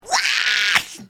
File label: screech